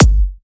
kick, drum hits; Anthemic

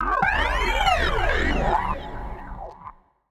TECHNO EXTRA 140

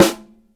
SD HIGH, snare drum; SNAREDRUMS

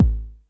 909 MD-KIK, TR-909 DRUMS

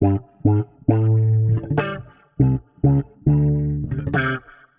Warped Bass, 100 A; Loops; Flickers; Touch Loops; CosmicFunk

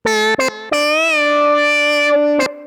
SYNTH 90 A; Keys

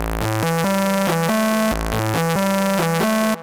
ELECTRO SYNTH 140 A

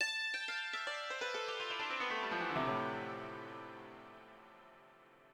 ethno, 90, D

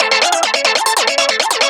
TECHNO SYNTH 140; Keys

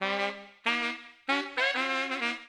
OLDSCHOOL BRASS 96

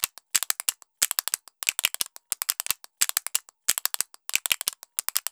ETHNO EXTRA 90; ethno2

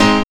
HIT

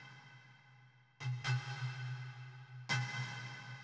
bottle, ethno, 125

